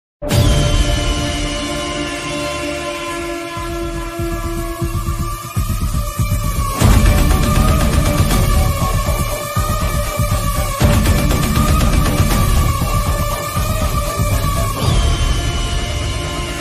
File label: eagle ringtone remix bgm; kgf